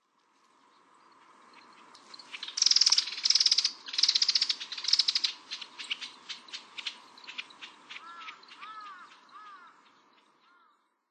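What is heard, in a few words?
Marsh Grassbird; watarase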